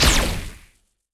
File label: Lightning